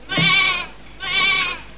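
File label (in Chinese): yang jiaosheng, sheep bleating; jiachu jiaosheng; jiaqing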